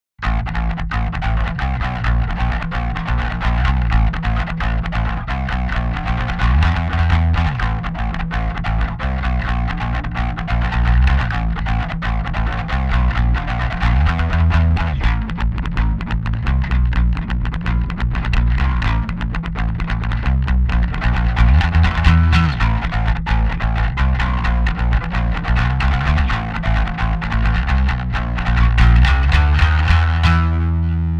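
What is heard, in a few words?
Scrambler III Pick, bass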